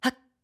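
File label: Climb, voice; Link; BOTW; Breath of the Wild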